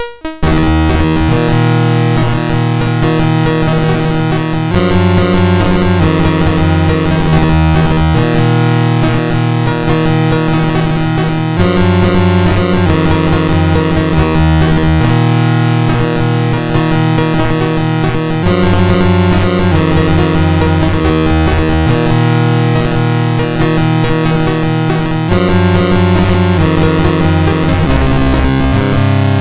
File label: Randomly generated music BASIC, sid MOS6581R2